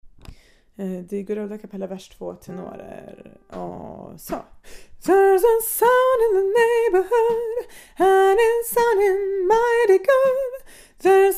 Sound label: vers tenor; Good old a capella